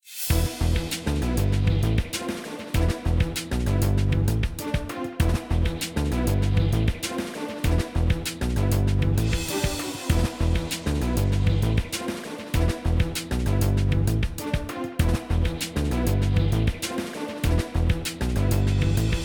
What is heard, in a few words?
combat bgm; MaidEngine